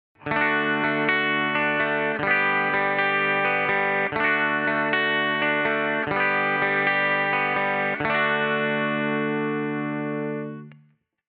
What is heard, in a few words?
guitar clean